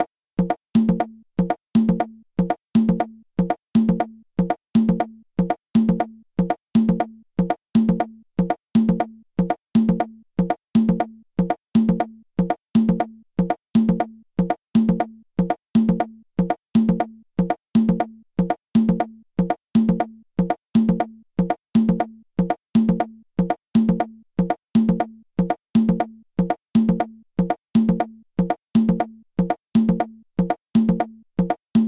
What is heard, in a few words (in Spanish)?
makuta mula